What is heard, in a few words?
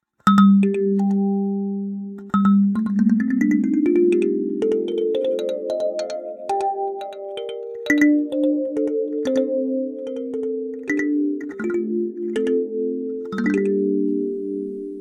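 Alto G minor, kalimba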